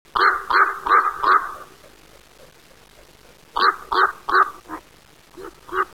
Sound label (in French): corbeau